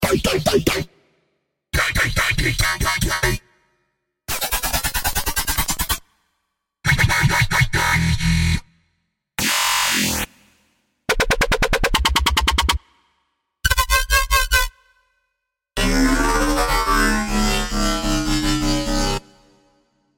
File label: DUBSTEP BASS DROPS; Serum Preset; Rocket Powered Sound